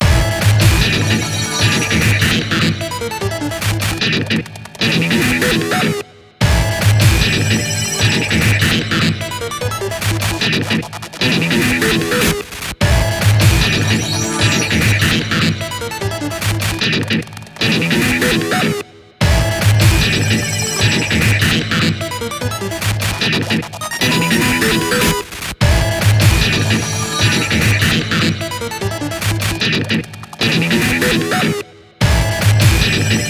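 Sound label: BGM Tension Loop; Azure Striker Gunvolt 2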